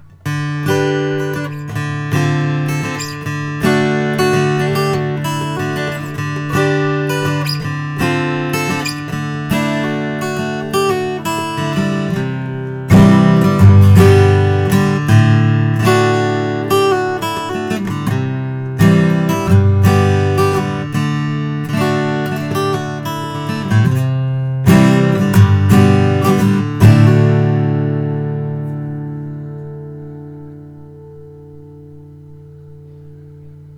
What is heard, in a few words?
oms adirondack braz natural; mj franks